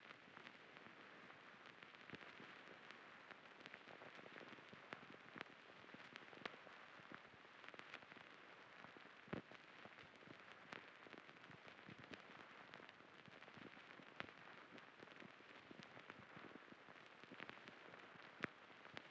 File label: 109 BPM D Min Vinyl, Afrobeats; Melodies; Loop Stems; Cymatics; GHOST (Beta Pack); Bembe